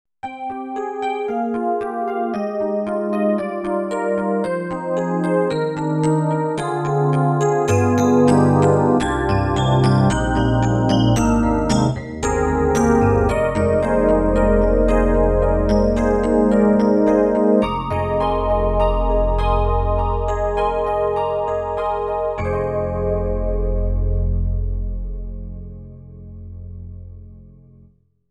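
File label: SD-90 Contemporary FM Electric Piano Legend; EDIROL; FM E.Piano; EP Legend 2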